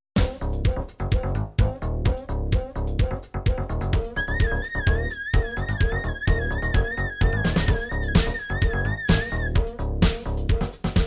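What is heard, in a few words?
dance techno dance house, midi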